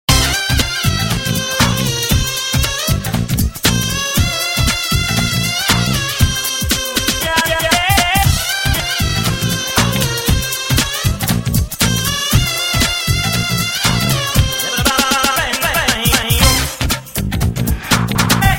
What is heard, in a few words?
Bombay remix